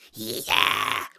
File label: monster taunt; spiritheroes